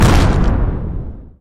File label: phaser explosion